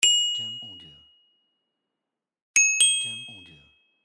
Bonus Bells

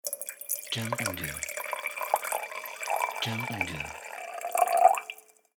Water Pouring into Glass

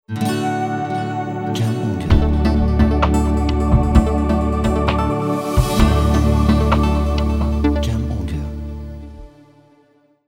Technology Ident

